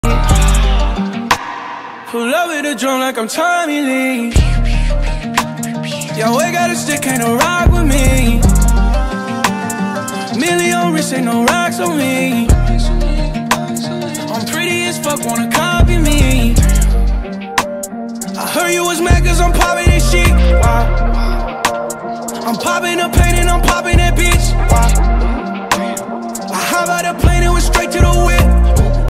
english ringtone

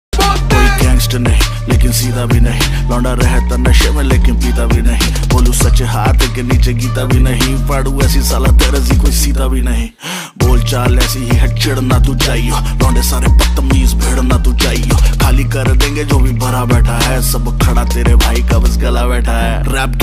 hindi rap ringtone

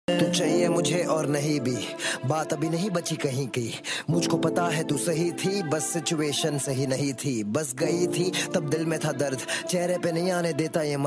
Rap ringtone

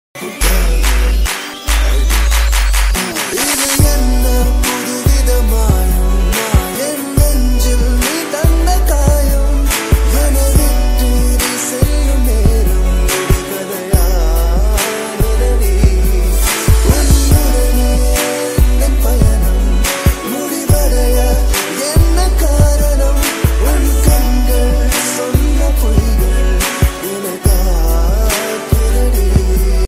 south bgm ringtones, tamil ringtone

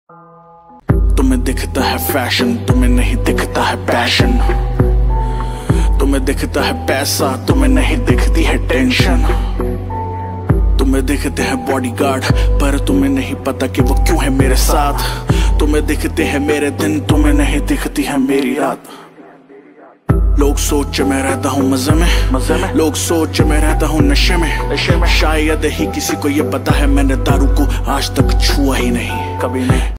hindi rap ringtone

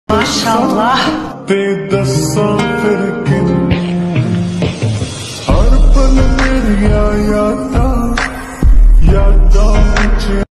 (lofi remix) ringtone

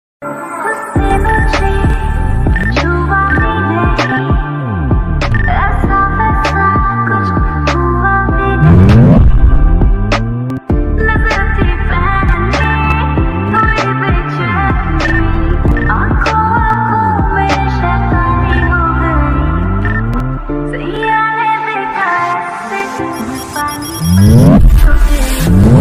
slowed reverb rap ringtone

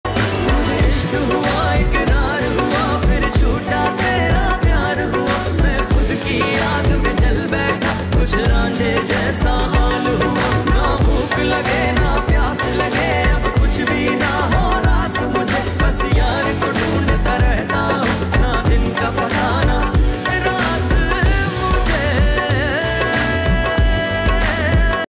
OST pakistani ringtone

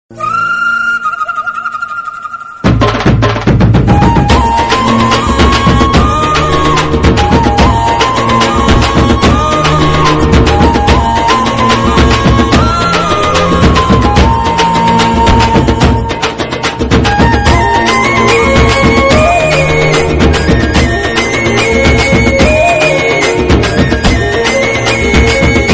Tamil love bgm ringtone

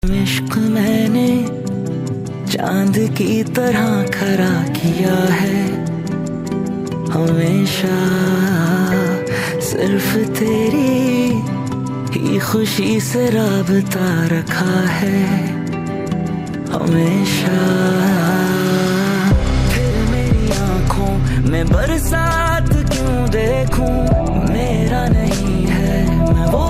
pakistani ringtone

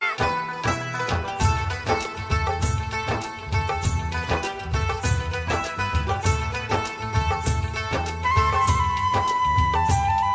BGM ringtone

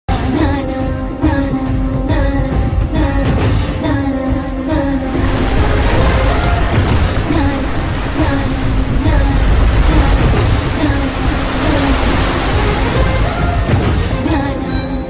BGM ringtone, tamil ringtone; Bahubali

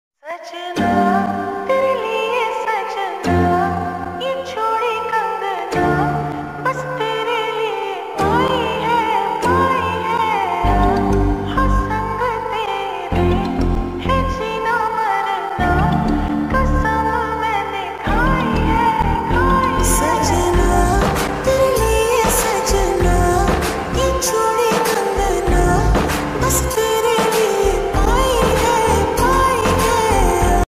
slowed reverb rap ringtone

